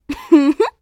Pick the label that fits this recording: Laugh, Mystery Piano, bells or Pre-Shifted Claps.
Laugh